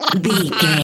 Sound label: goblin fairy laugh deep